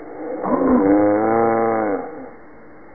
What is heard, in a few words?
zombie alert